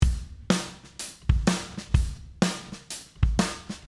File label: Drum loop FET; compressor